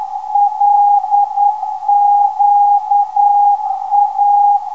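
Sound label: 416kHz, non-directional beacon; LQA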